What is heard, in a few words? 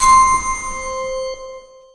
killsound; quake